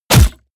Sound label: crossbow hit generic